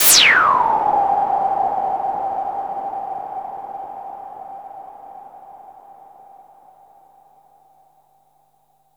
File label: NOISE SHOT; Best Service Alpha Dance vol.2